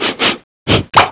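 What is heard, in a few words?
sniff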